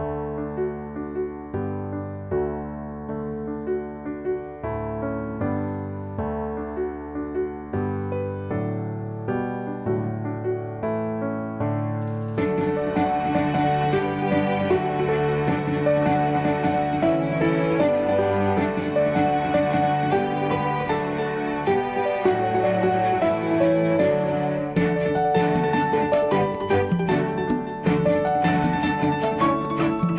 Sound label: Music Only